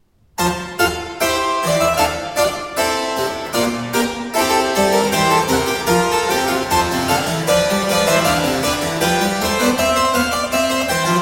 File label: Suite in G major Gavotte; Suite No 5; BWV 816; Johann Sebastian Bach; JS Bach French Suites